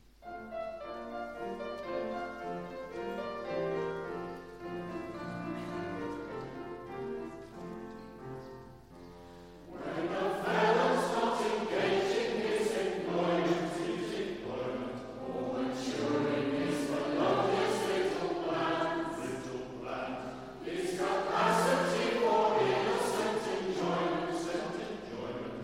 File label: Policemans chorus